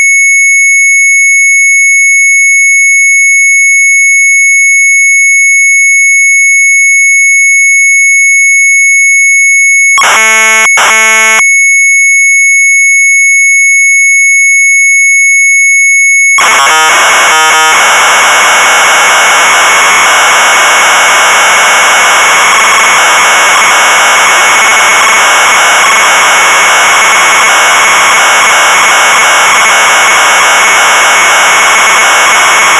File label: Sharp MZ800 Tape; DIAMANTZOEKER; retromelsarchive